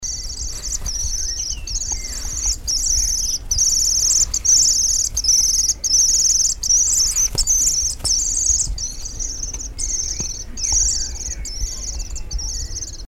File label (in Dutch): jonge vogel, gierzwaluwen; Deurne; van een jong